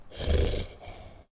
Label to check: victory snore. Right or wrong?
Right